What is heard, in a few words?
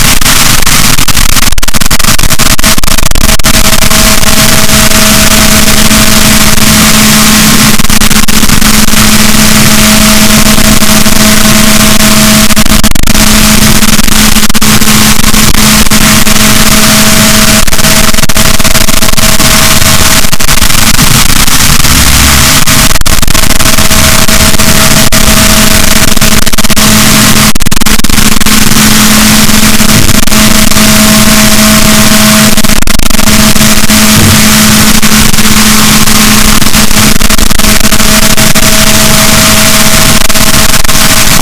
rorquals, Balaenoptera Physalus, boat